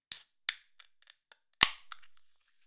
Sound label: Open Can